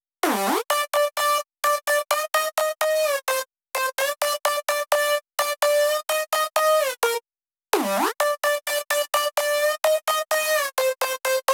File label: 128BPM Synth Lead Add2 Dry noSC, Root G; Vengeance - Essential Clubsounds Vol.5; VEC5 Demo Constr Kits; Early Morning Kit